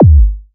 SY KICK, SYNTH KICKS; Best Service Alpha Dance vol.2

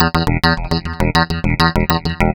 Tech Octave, Keyboards

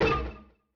Amb Hit; Yamaha DTXpress-II

